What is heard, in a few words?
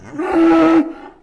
preattack; zoochosis; parasite titan